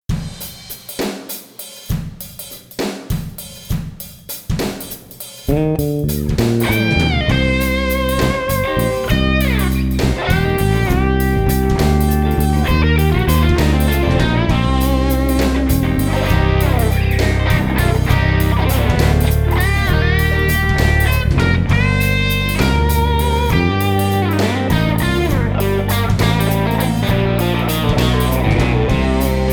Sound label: S3 BASSWOOD, bass; roscoe 5995